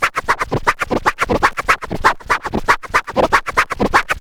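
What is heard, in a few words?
SCRATCH TOOLS; AMG Megabass REMIX! VOL-2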